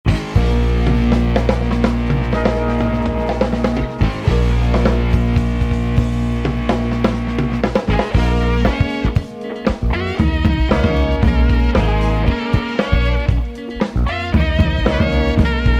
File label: African Band